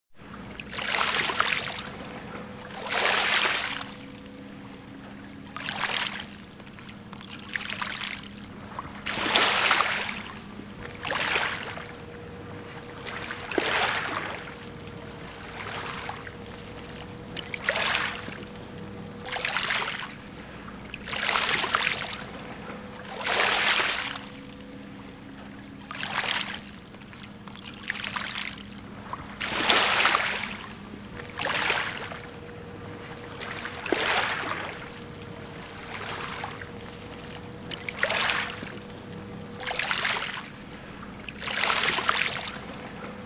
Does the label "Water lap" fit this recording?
Yes